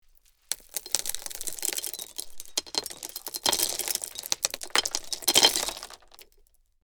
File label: Slowly pouring small gravel